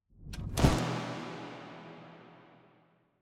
opened drop

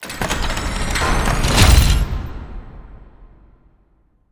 case open

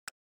game start

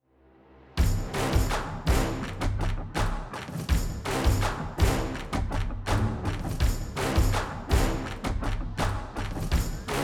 case open long

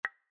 plinko bounce

